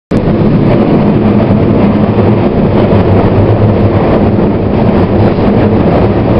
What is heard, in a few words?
Flange, Train; Taurus Siemens